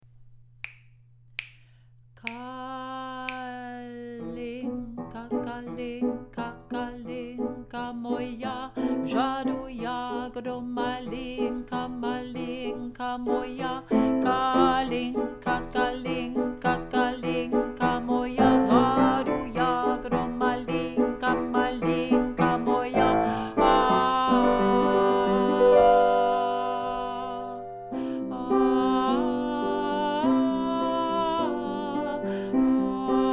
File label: kalinka tenor